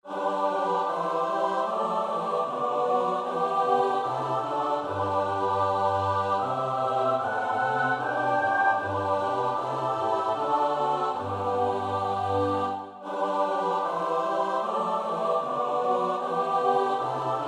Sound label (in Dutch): S-A-T-B, koorpartij; Bach (Johann Sebastiaan); BWV 115